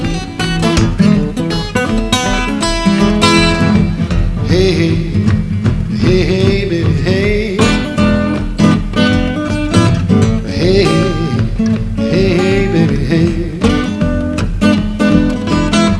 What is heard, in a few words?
clap; Medienmusik